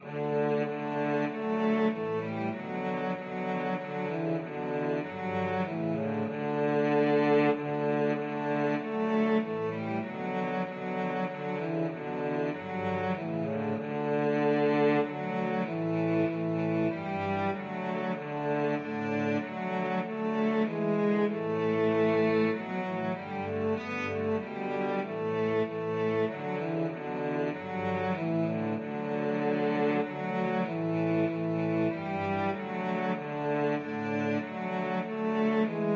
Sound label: Christmas Hymn, cello trio